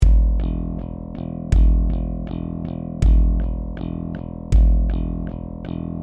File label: drum bass